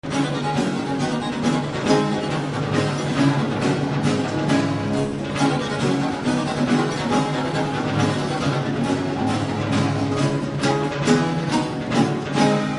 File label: Gallardas